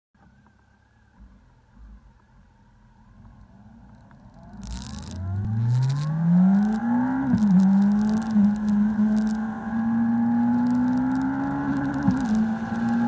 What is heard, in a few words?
CB 1300